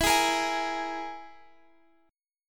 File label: E-Major Flat 5th E, Guitar Open F; EMb5 strummed